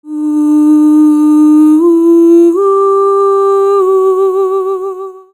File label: Celtic Voice; Sonic Foundry (Sony Creative Software); Continental Drift Aftershocks; Vocals